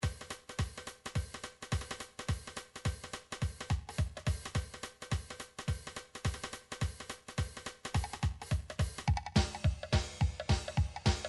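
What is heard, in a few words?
drum charleston; Drum Midi Files Collection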